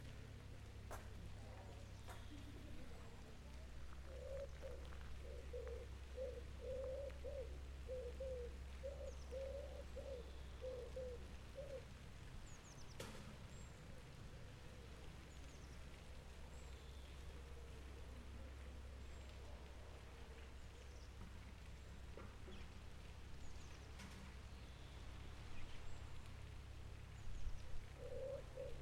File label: Copenhagen Bird Calls and Water, natural sounds